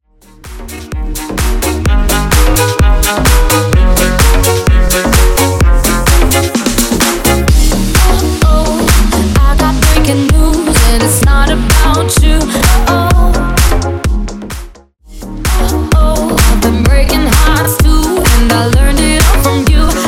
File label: Re Drum Bootlegs 128bpm